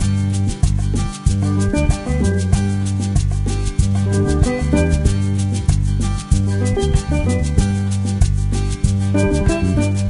Cruise Music; Diner Dash: Flo on the Go; NDS

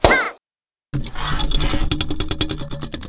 Shake Metal Start; talking tom cat 2 android ios online gamerip 2011

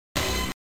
pickup tone

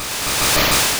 Quick Attack; sfx; attack moves; pokemon; gen 1; rby

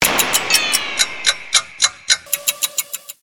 slow time short

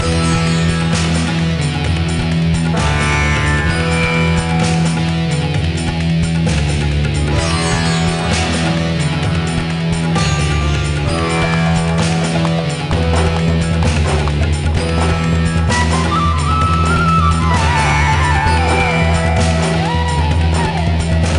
Desert Music Loop; bigfoot collision course; nds

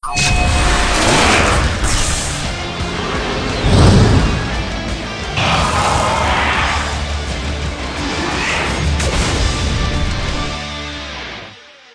Battle Music; Zak Storm Super Pirate; mobile; Battle Vapir Music

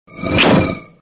Teleport; Sonic.EXE

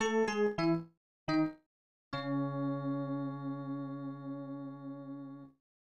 Lose; Poi Poi Ninja World; snes

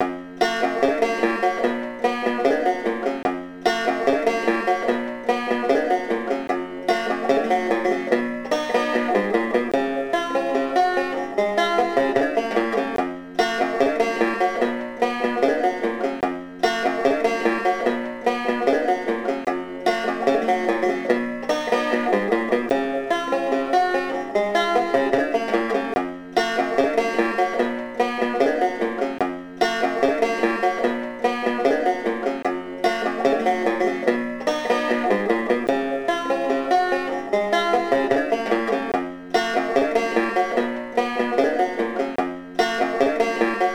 Banjo riff; Sesame Street; Elmo's Musical Monsterpiece; NDS

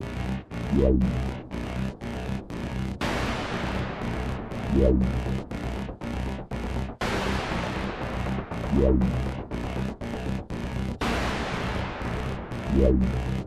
Power Dome Crystal Deactivate

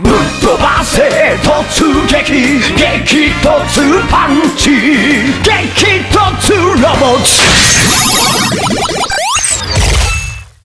Change Music; Kamen Rider Climax Scramble Zi-O

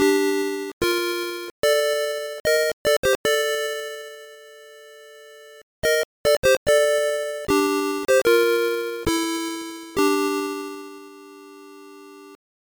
Death; Space Quest 2; Tandy

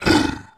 Monster Orc Death; Inotia 3

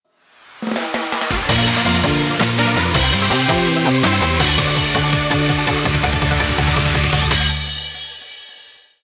Result (Eliminated) [Game Over]

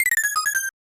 Minigame Lose; Kirby's Dream Land 3

